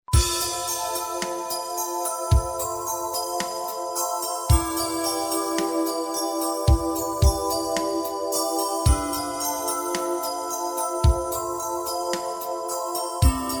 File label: MUSIC BOX